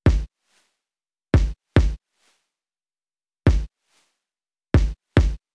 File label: kick